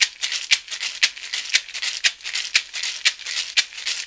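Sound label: perc african shaker, shaker loops; Roland LCDP14 Africa VOL-2; BTS Perc.Loops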